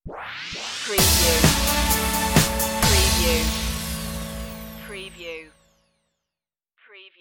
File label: twilight stinger